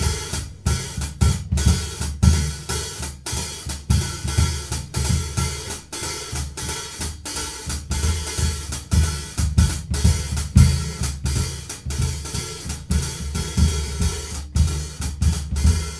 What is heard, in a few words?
090 Trad Jazz Drums; Pro Tools Essential Loops; Digidesign